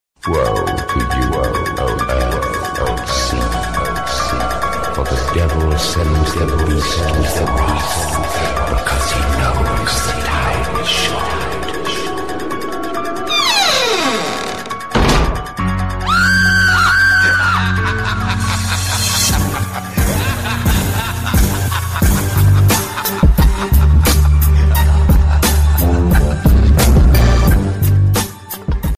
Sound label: adventurous music ringtone